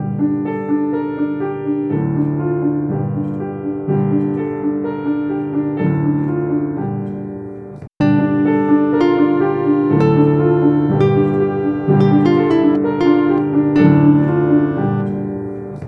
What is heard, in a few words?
Piano; Ableton